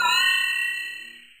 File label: Bricks Removed; Denkspiele; Hexvex